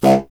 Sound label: C. Bassoon, solo instruments